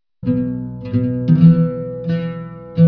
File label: dust music